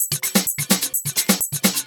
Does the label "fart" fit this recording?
No